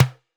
Tom High; One Shots; Drums

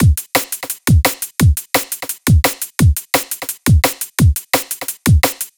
Drum and Bass Straight 172 bpm; Loops; Ableton Live 12 Lite; Core Library; Drums

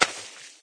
splat miss, paintgun; There; ThereClient

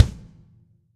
Kick Plastic Hard Bright Room

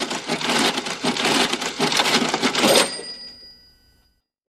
Cash Register; One Shots; FX; Ableton Live 12 Lite; Core Library

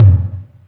Tom Vinyl, Drums; One Shots

